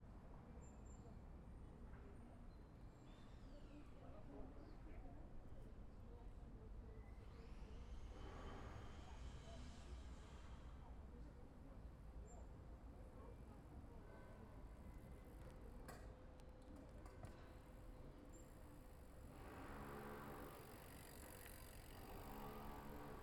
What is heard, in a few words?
Village Ambience; Ambisonics; Sonic Bat